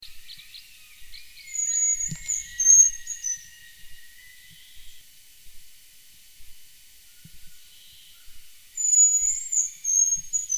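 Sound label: hazel grouse voice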